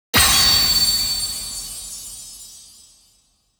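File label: Sound Effect Result Rank 1st; Se Ingame Result Rank 1st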